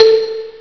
d50 bass marimba hi, Amiga Soundtracker Sample Packs